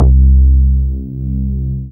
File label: BAS MINI, Mini-Moog